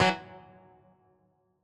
dialog error; stereo

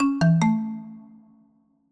desktop logout; stereo

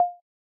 message low priority; stereo

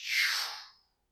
message sent email; stereo

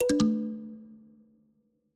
battery low; stereo

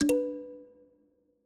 dialog question; stereo

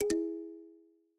phone hangup; stereo

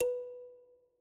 bell window system; stereo

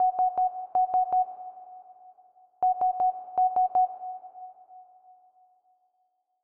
alarm clock elapsed; stereo

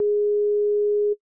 phone outgoing calling; stereo

